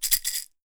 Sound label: PEYOTE, PERC; AKAI S6000 CD-ROM - WorldSounds